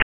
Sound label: Amiga, Switch, Sound; Dungeon Master and Chaos Strikes Back